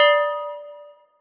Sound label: bell synth; Max